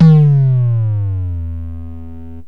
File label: yamaha rm1x synth bass